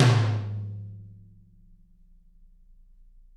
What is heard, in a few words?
Toms; Bob Clearmountain Drums I